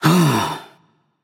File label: nv sigh; smite; xbalanque